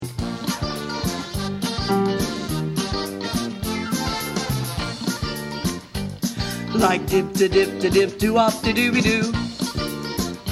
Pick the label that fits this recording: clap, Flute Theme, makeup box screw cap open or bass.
bass